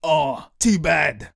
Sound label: Taunt Aww; StowAway